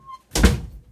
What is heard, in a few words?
door close; Golden Crayon Sounds